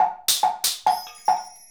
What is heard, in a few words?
Tambourine, Chimes, and Wood Block